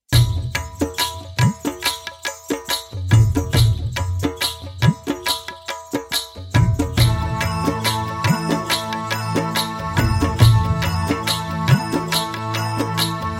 Dholak

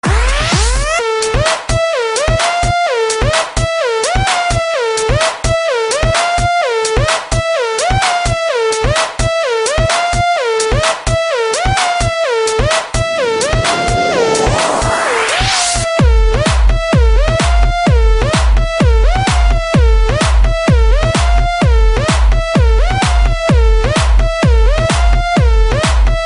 Funky Club

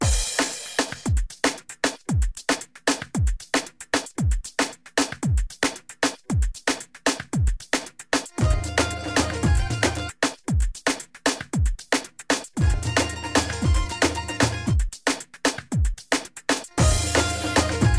Albanian Mix Shqip

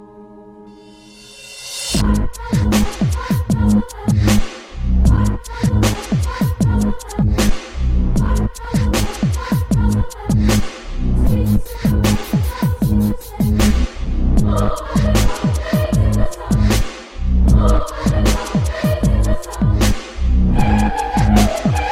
christmas dubstep

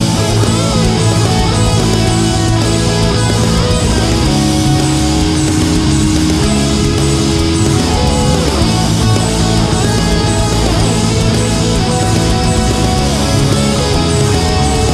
Rock

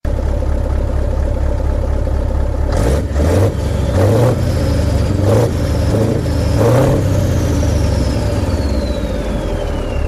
Scania V8; Scania 142

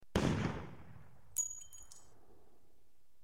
Fn Scar Gun

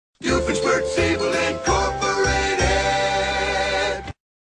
Alert

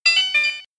Call disconnect

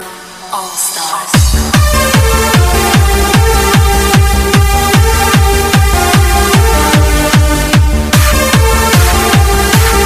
Trance Dance

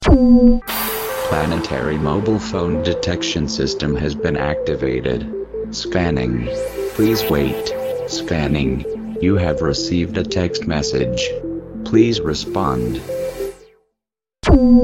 Space Text Message

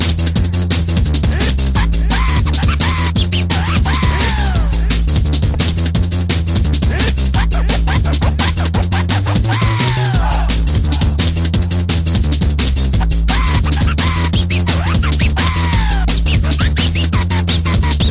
Helicopter

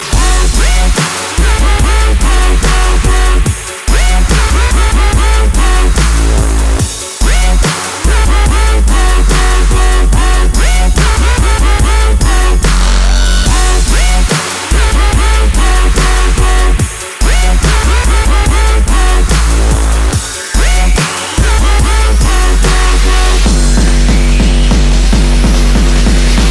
Dubstep Ringtone

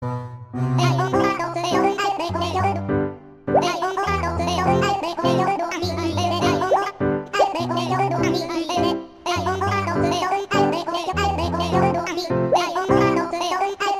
Babbling

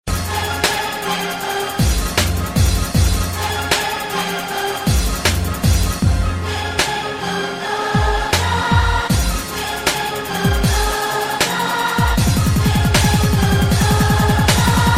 Hip Hop Beat

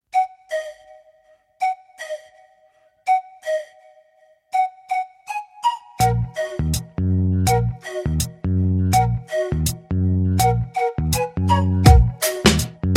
Flute N Drums

